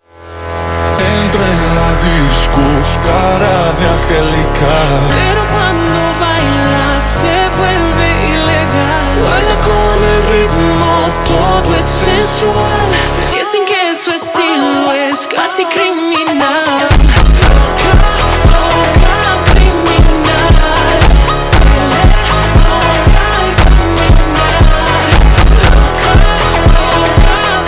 super Slowed, funk

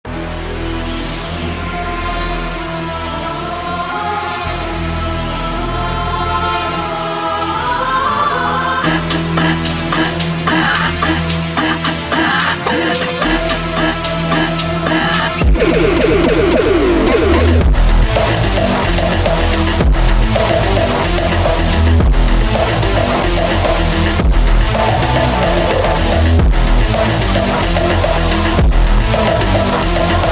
Funk sped Up, funk

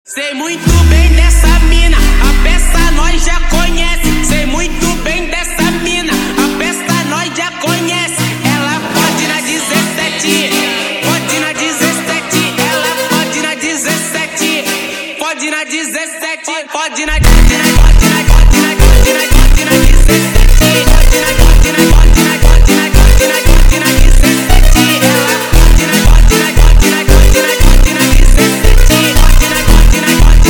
Aura Funk slowed

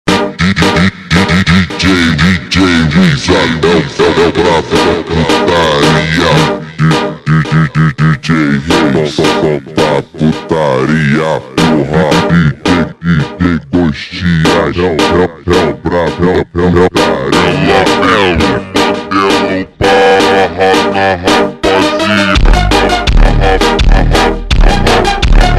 funk

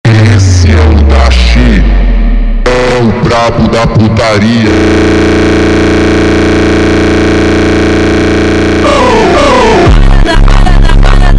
funk

